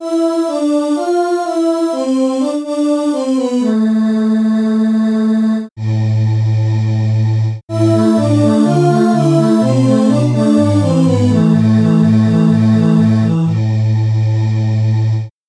synth voice

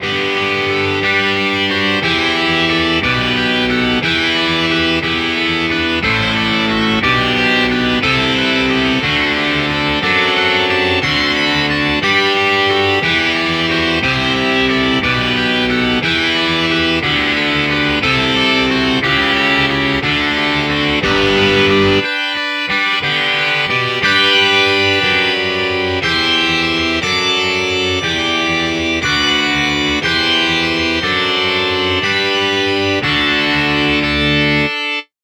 overdrive guitar bach

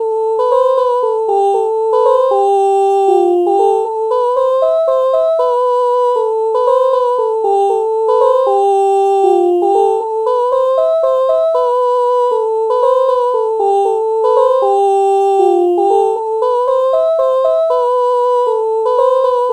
oh oh melody morph

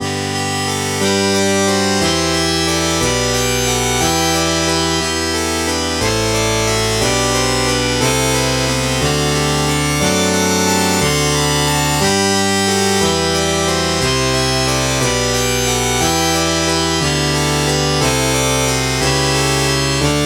muted trumpet

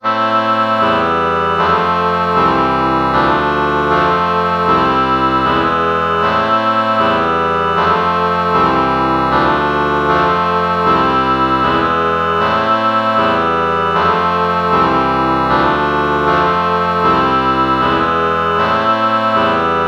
oboe oboe chord morph

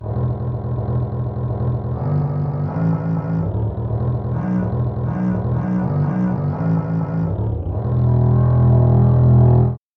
viola bass

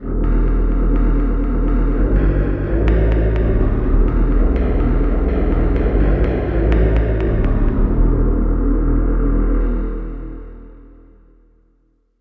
echo drops bass

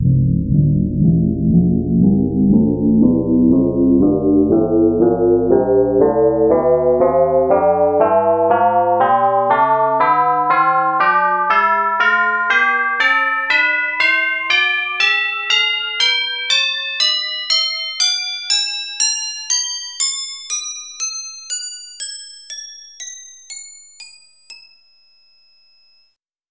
tubular bells tonleiter

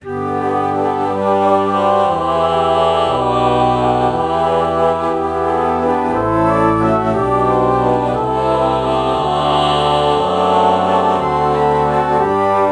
alto flute ah bach morph

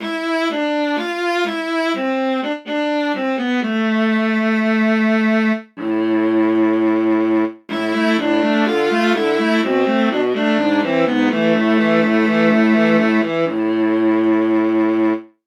viola

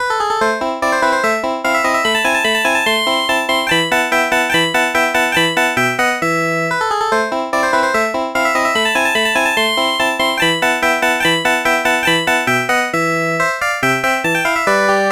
picked bass

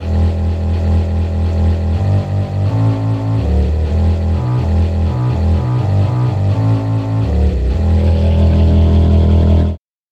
violin bass

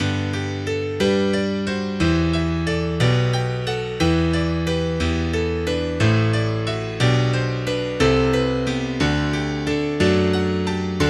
honky tonk

